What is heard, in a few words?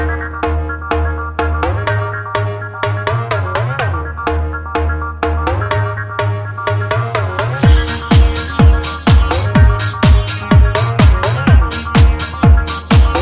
Banjo